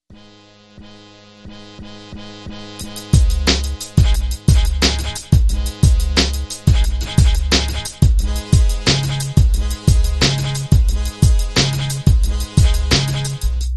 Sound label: Trill